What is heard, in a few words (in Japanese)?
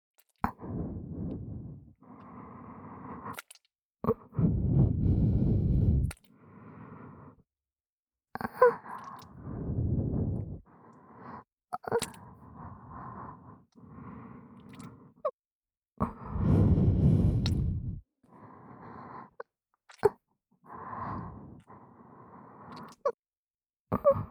(右)おまけの耳元囁き喘ぎエッチ, KU100 くちゅ系効果音版